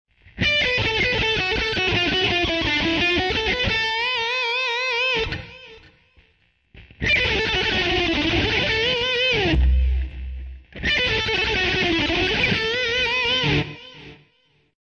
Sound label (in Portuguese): guitar alternada sobre pentatonica